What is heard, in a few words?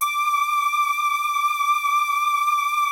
PERU LEAD D5; Music Store - Claudius Diemeter Schwerlos; FINE ART 3